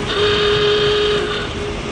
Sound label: DISTANT SHIP ALARM